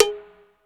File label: AMB P COW, HALL KITS; Crypton - DrumBase5000